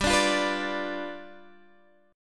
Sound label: G 7th G, Guitar Half Step Down